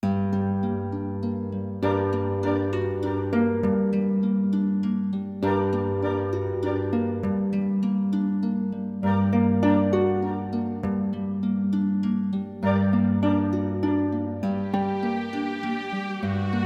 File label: Mysterious - Haunted Castle; Witch of the Ice Kingdom Collection